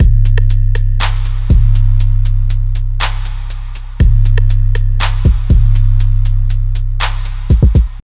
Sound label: Dance Snare Beat